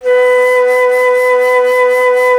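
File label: flute A; Miroslav Vitous - Solo Instruments VOL-2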